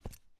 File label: half jump